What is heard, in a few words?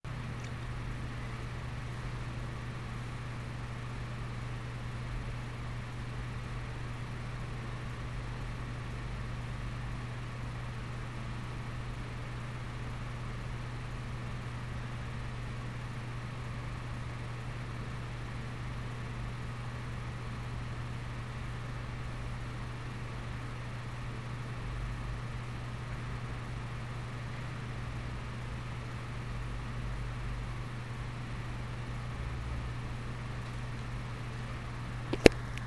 Accelero XTREME Plus IDLE